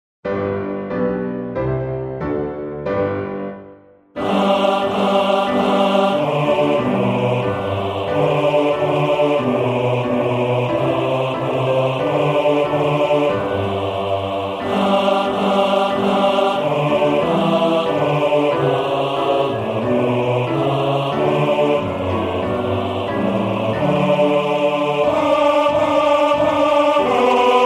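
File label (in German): Bass, Männerchor; Mendelssohn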